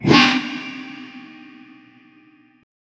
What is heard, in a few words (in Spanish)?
sonido magico de luz